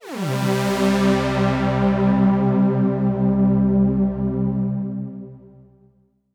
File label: Synth C, Synth Prophet One Shots; Electronisounds